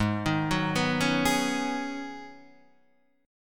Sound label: Ab Minor 11th, Ab, Guitar, DADGAD